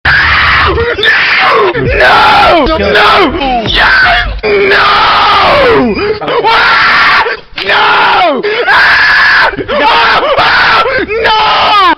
screaming

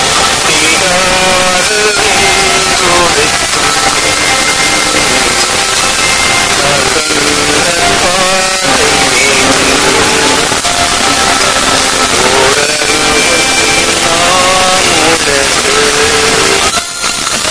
loud

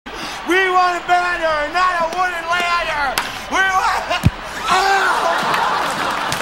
baseball hit

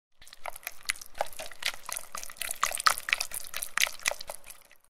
gooey sounds

